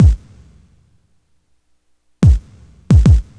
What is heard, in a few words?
GRV, Guit It On; E-MU Formula 4000 Series Vol. 1; Hip Hop Nation; Guit It On 78.5